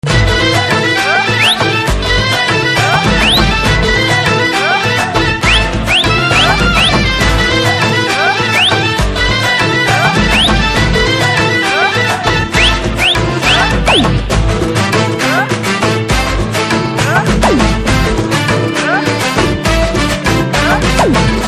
Instrumental Drum Beats Ringtone

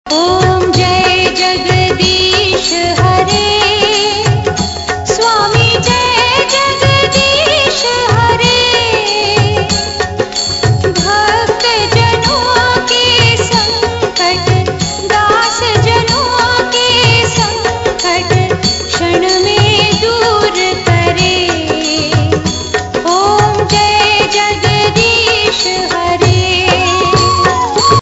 Aarati Song Ringtone

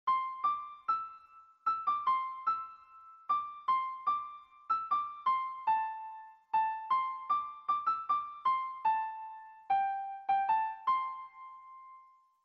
English Melody

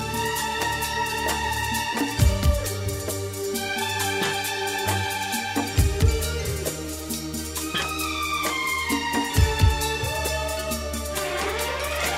Instrumental

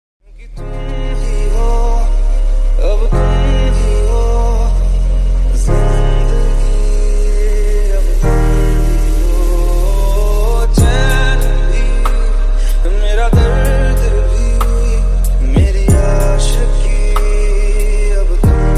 Ringtone; Aashiqui 2

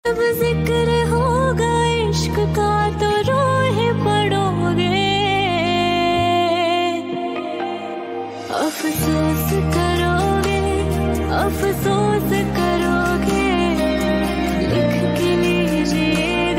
Female Version Love Ringtone

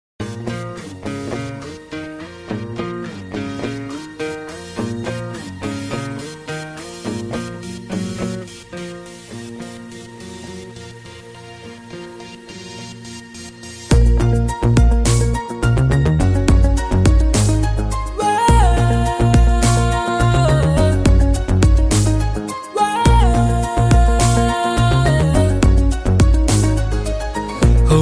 Kannada Ringtone

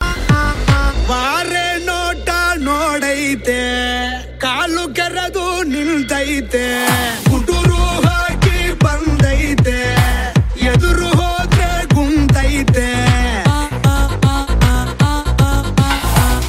Song Tone

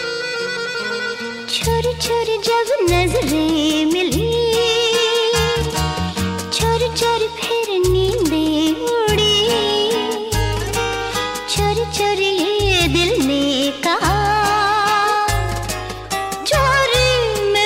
Female Version

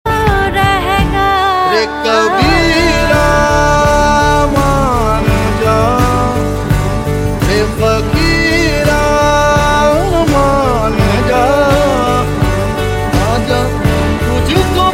Love Ringtone

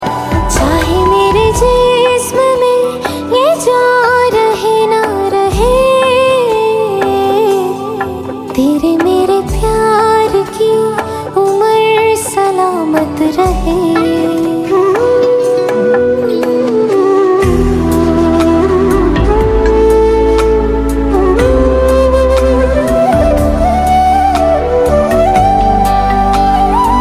Song Instrumental

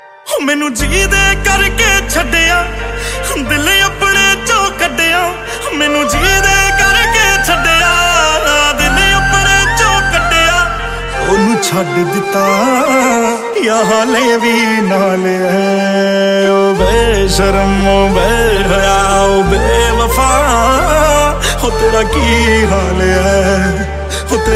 Song Tone

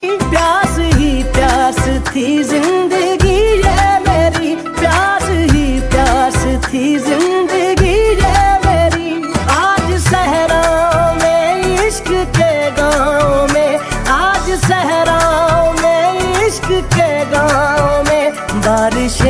Love Ringtone

